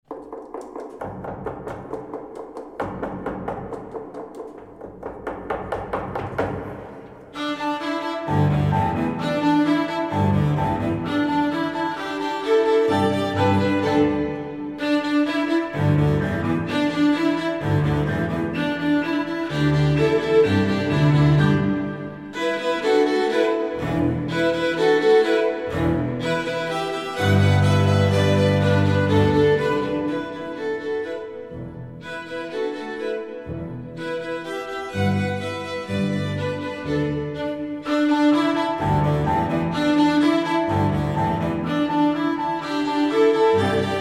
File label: Chinese Drums